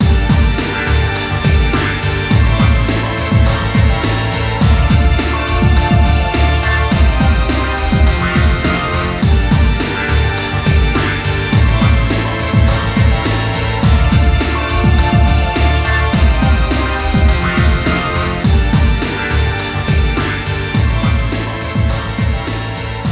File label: Club Ambient BGM; Sega